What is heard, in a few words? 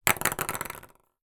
sport table tennis ping pong balls x4 bounce on table